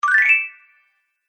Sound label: Bright Idea, cartoon; Cartoon Sound Design Sound Effects